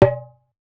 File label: darbuka note